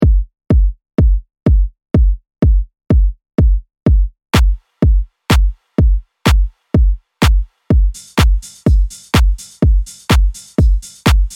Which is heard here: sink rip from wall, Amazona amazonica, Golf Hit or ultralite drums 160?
ultralite drums 160